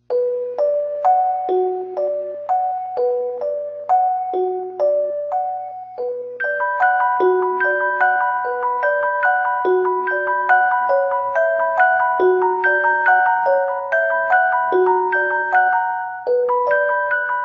Music Box

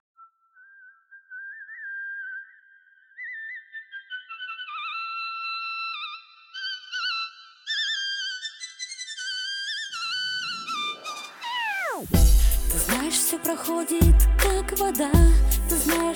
Zvuki Fleyty

